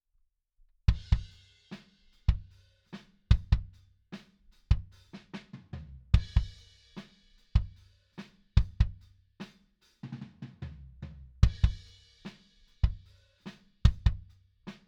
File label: Kick